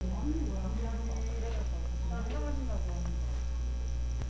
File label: bucket